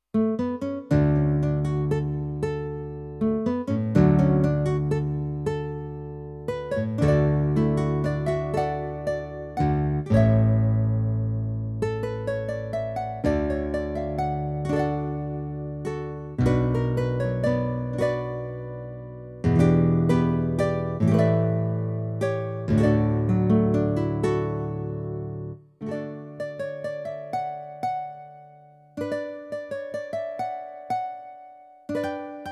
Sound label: Guitar